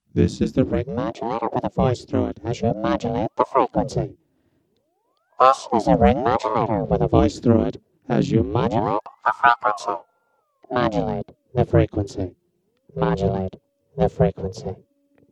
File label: Internal Osc Modded X Voice, ring modulator; analog synth